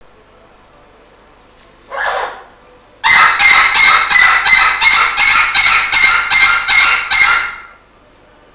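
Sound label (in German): meckern, Papageien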